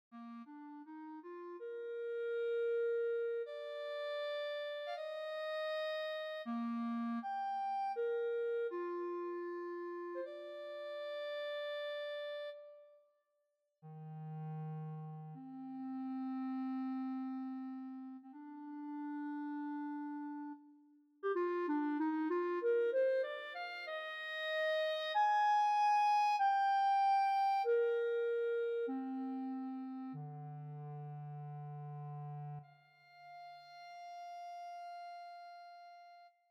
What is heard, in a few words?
Interlude midi; MIDI rendering of the orchestral score for the Missa Pax